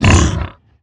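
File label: hoglin hurt, 疣猪兽：受伤; Minecraft; 我的世界; 疣猪兽是一种生成在绯红森林的攻击型生物。它们是目前唯一可繁殖的攻击型生物。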